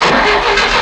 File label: eng